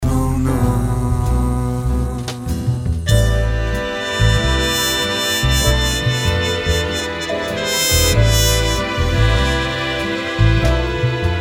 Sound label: swing